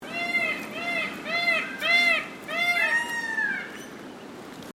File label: Bird Peacock Mixed Call; Peacocks in Victoria and South Australia; Birds (Sound Effects:Animals)